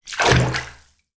household bath water splash deep; sound effects one

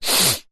human sniff hard blocked nose; zapsplat

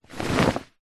foley clothing shirt grab pick up

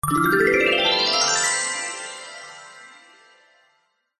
magic glissando reveal spell bright chimes; fantasy; zapsplat

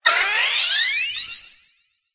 squeaky metal Designed Vintage; PMSFX

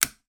household oil spray lid put on

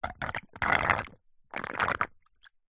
human drink slurp cola through straw; zapsplat

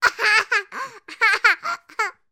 human boy 3 years old british laugh

